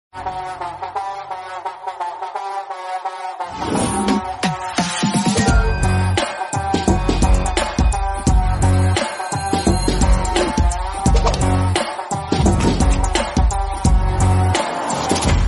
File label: movie mass bgm ringtone; Khiladi